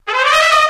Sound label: trumpet danger; music; Left 4 Dead 2; Source Engine; zombat